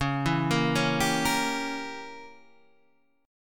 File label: Db Diminished 7th Db down Guitar Standard; arpeggiated; JGuitar